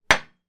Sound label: household coffee mug down on glass table